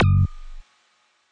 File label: Zap